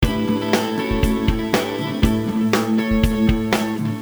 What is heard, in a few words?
depressing rock music